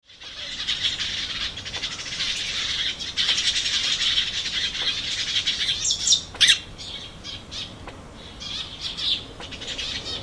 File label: birds, field recordings; takamatsu